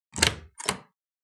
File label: Door handle open; Phasmophobia